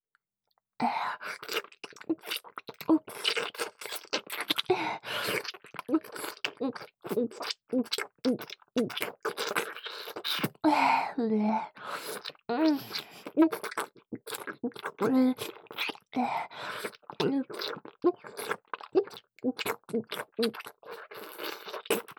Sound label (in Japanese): 激しめの フェラ; おまけBGV